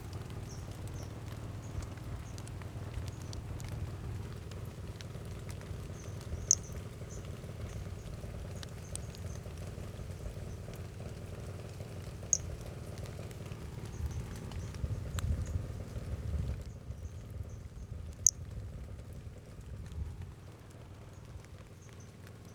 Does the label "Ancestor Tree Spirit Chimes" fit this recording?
No